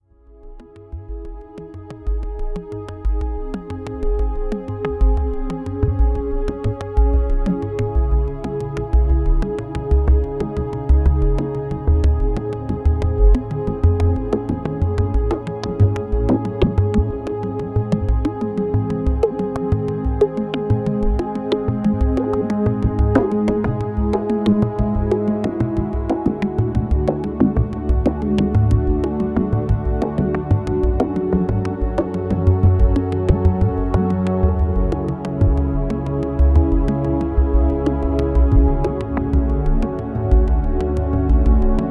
Juno-60 External Filter FM

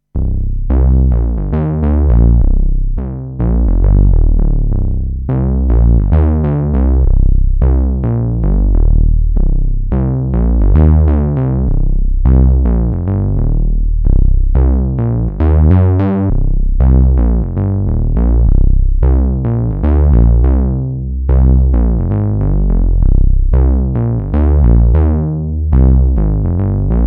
Bass; PERfourMER

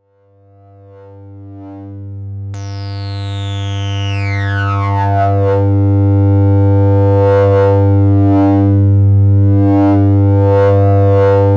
sonic six filter tracking

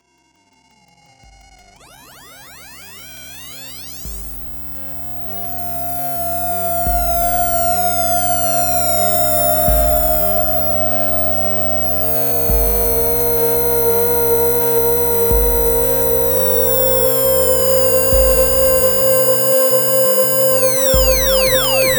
PWM Prophet5; RetroWave

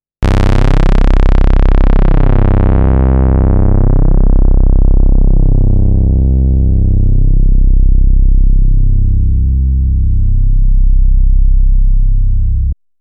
VCF in min reso, synthesizer

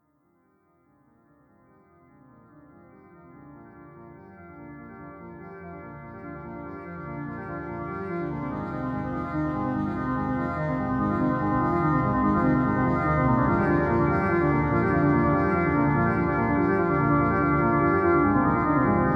Waldorf Quantum; Koyaanisqatsi

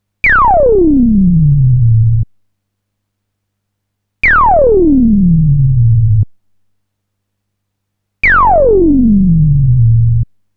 SQ Bass, synthesizer

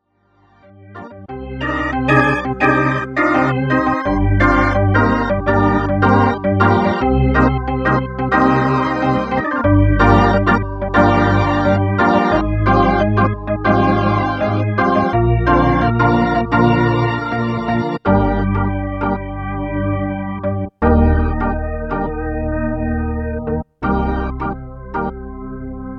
s3 jazz organ

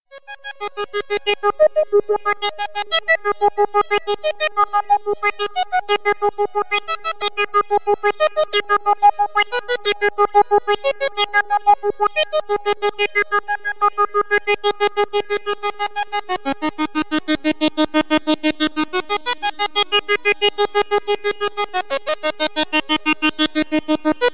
ms20 filter sweeps

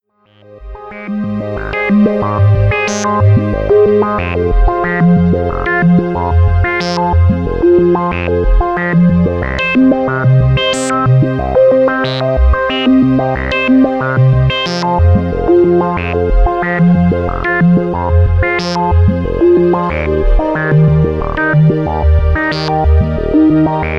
Juno-60 JD-800

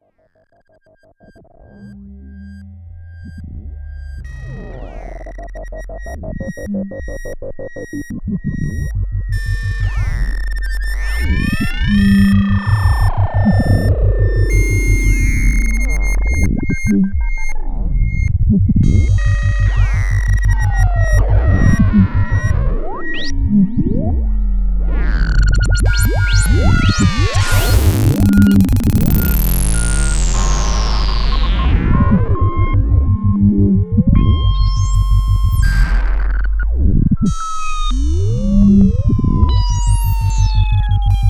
VC LFO; Dominion1

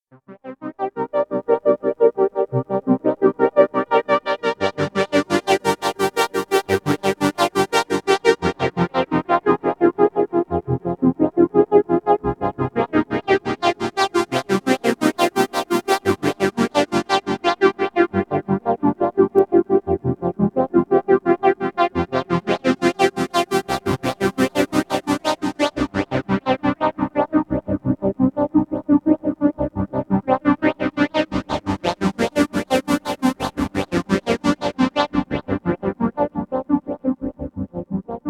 ps3100 Triple Reso and Amp Modulation

